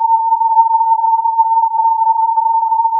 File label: filtered input